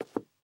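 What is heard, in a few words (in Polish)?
kroki pojedynczy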